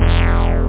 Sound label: synth; da capo vol1; effects rave